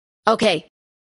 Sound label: grenade blast sound; pubg